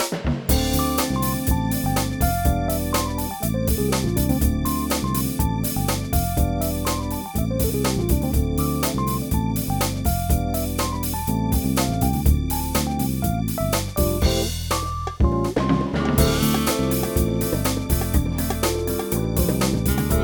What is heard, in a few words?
Victory, pinball; Pinball 3; pro pinball trilogy 2001; dreamcast gamerip